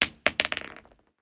Drop Grenade; blue archive

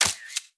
Snap; blue archive

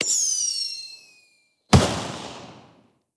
Fire Works; blue archive

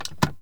signal on, ui

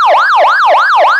yelp, sirens; code3 z3

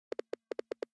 Typing; blue archive

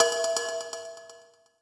Throw; blue archive

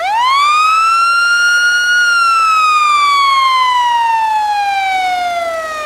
wail, sirens; gta

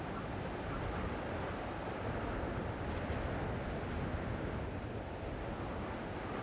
BG High Wind; blue archive

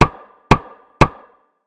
Knock; blue archive

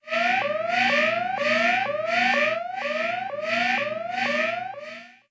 Alarm; blue archive

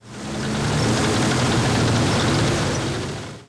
Tank; blue archive

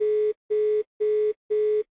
Hang Up; blue archive

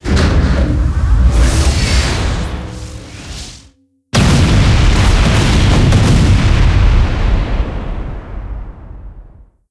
Big Laser; blue archive

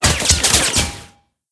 Ricochet; blue archive

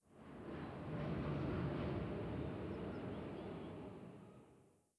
Tram; blue archive

